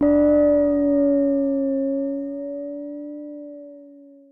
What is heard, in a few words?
FX Twilite Bell